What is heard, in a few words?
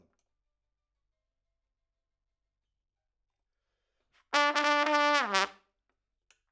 Trump